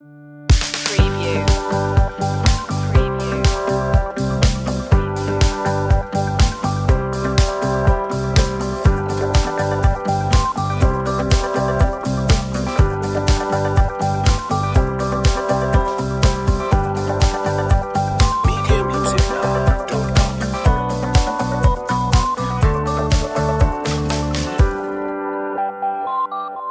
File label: hopeful